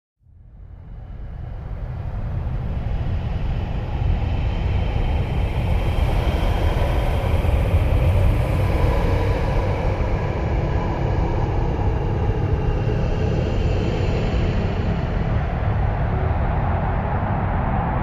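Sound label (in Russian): взлет двух космических кораблей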